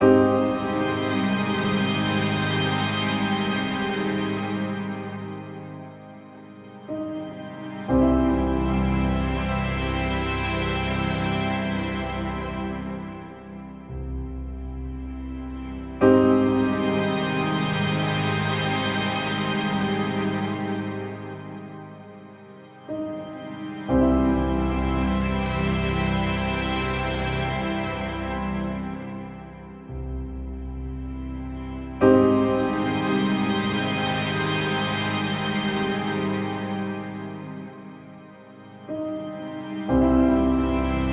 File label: music floating through space; zapsplat